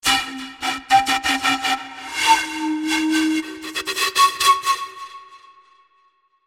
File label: PAN FLU FX; eShop